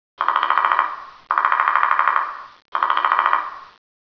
dead tree

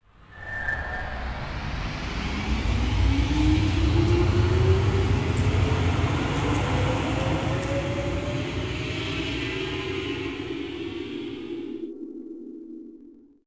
subway

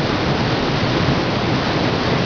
waterfall

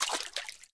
step

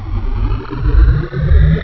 gun click

